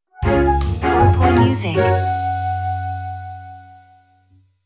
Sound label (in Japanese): jingle ウォーター